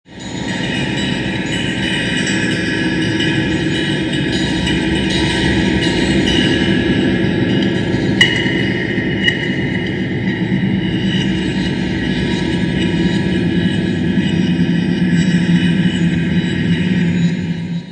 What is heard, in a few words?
kestrel